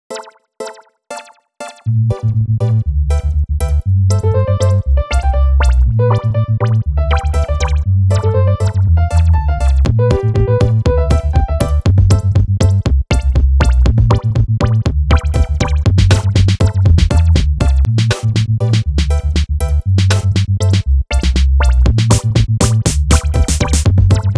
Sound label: Background Loop